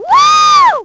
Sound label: woo, Brit; Splashdown; XBOX